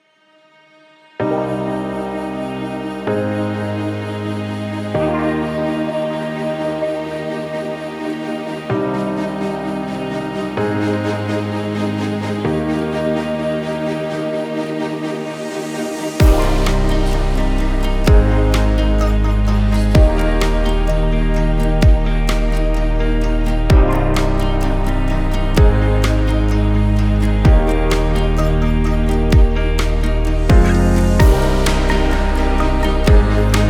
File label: bright inspiration